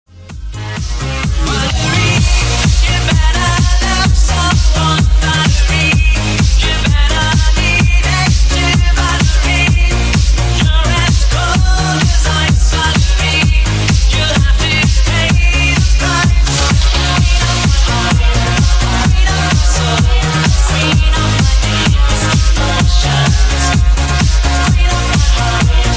remix

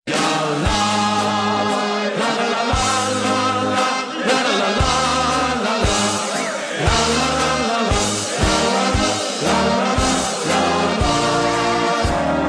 dutch holland football ringtone

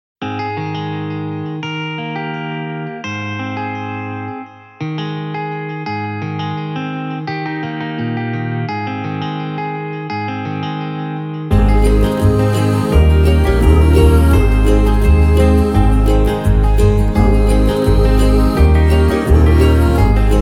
piano cover ringtone

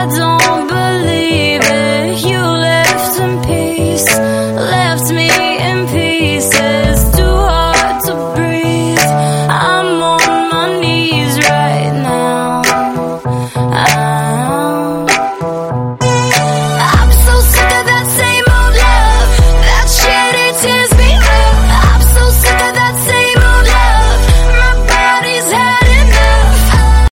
old love ringtone

